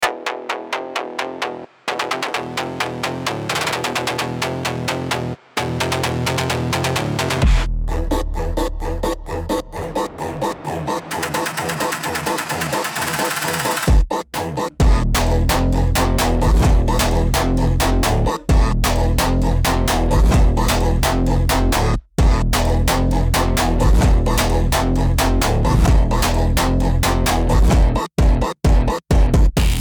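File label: phonk slowed; slowed krutoj fonk dlja drifta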